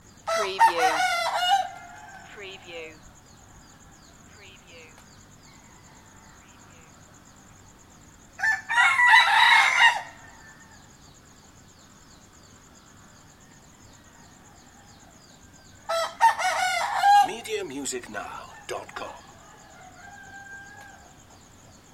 morning cockcrows ambience; loop

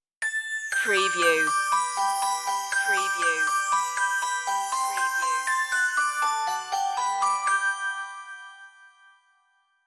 merry christmas synthified stinger intro

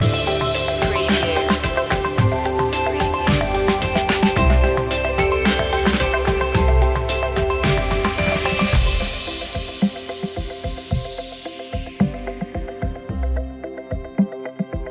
that feeling no vocals

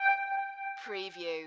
musical

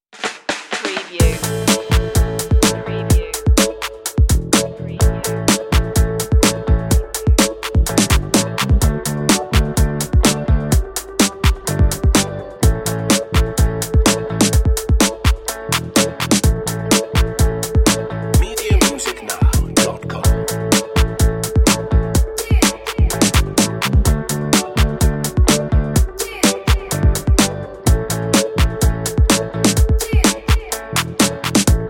groovy

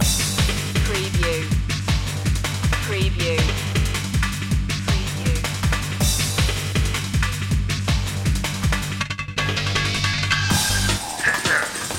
shock load loop

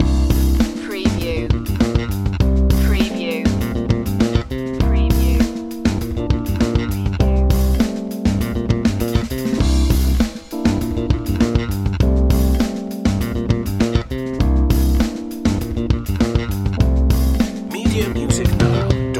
funk cake loop

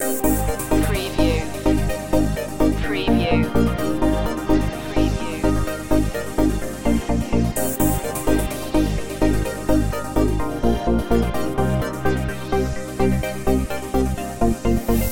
pulse loop

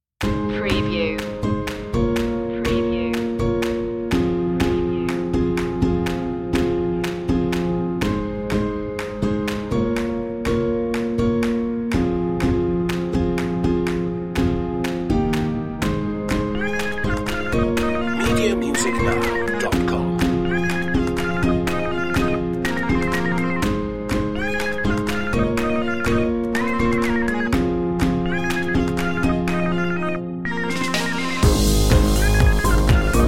no synth lead

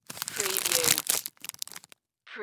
Cracker Package Hand

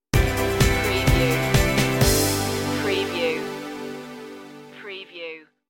ultraviolet stinger

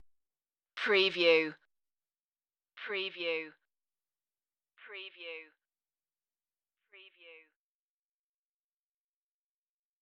bass drums sound fx only

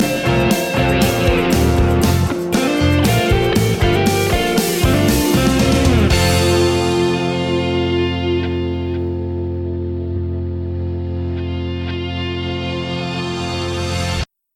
wake up pop

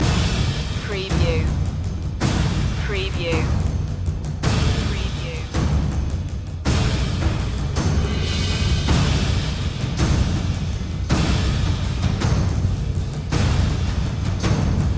percussion loop

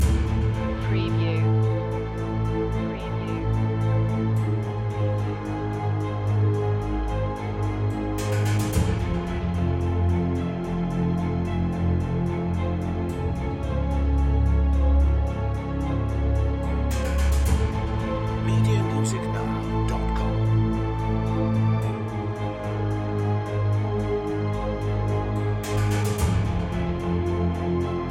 dark lab long looping

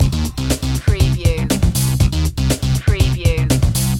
retro electro loop

